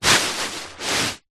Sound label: household beanbag small sit on